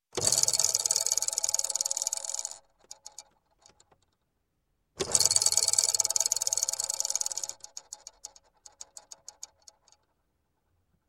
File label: Toy Spinning Toy; Sound Ideas 2000 Series